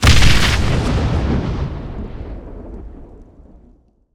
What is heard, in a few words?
explo grenade; PremiumBeat; Explosions